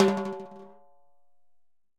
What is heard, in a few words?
Timb H Pressroll, Timbales; Latin Percussion; Drums; Drum Hits; Ableton; Factory Packs